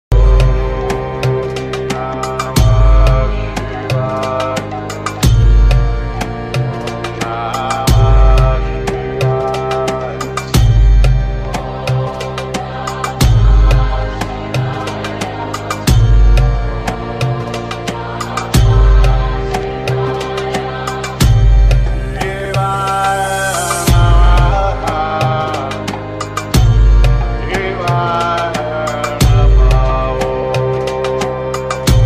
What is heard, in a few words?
mahadev ringtone om namah shivaya